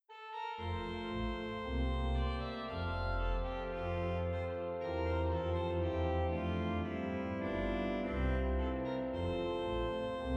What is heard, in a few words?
Trumpet Tune, Hoofdorgel; Rotterdam; Psalm 75; Gerrit Koele; Sweelinq orgel